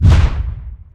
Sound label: bolt reaction; STALZONE; somber anomaly